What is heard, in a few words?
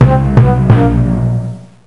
Roman Lose; Mystery Tales: Time Travel; NDS